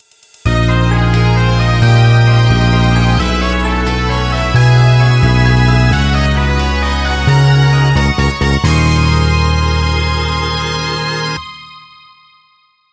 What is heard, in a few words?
Course Intro Fanfare; Mario Kart 7